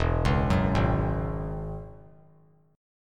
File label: E 6th Add 9th E down; E6add9 arpeggiated; JGuitar